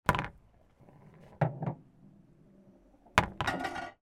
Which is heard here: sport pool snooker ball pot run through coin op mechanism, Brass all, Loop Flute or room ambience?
sport pool snooker ball pot run through coin op mechanism